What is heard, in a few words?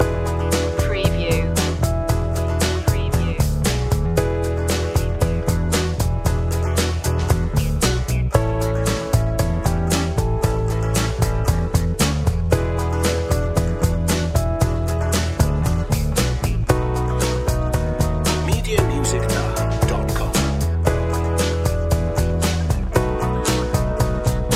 funky groove loop, A sweet and groovy vintage funky track